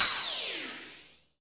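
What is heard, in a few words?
EFEX cymbal, FX DRUM; AKAI S6000 CD-ROM - Volume 1; DRUM RYTHM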